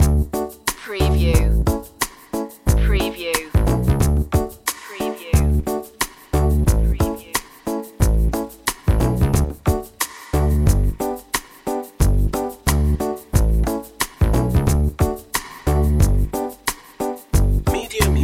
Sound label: retro video game labyrinth loop